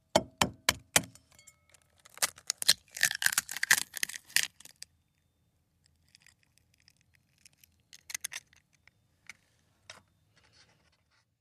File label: Cracking Egg A Bowl, kitchens